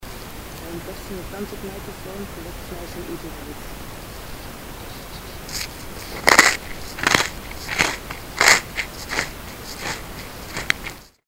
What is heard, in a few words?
chewing